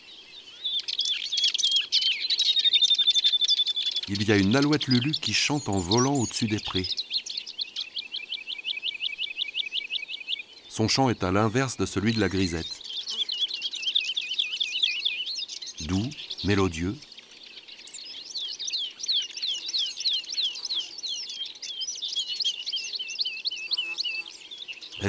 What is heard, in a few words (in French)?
Alouette lulu; ABC des chants d oiseaux